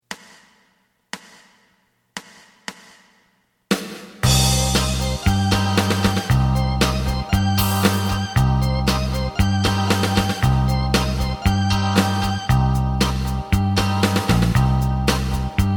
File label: lead